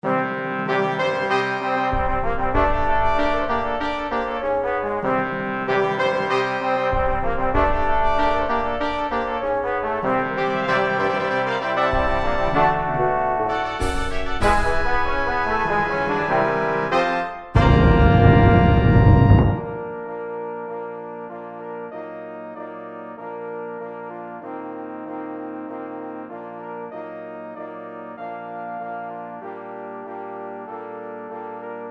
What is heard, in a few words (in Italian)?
Fanfare